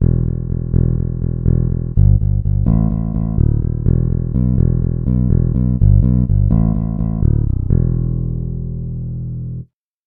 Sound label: fingered bass bass